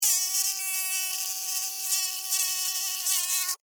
Mosquito Fly By, Animal, Mosquito, Fly, By, Wings, Insect, Bug; Cartoon; Mosquitoe; Mosquitoes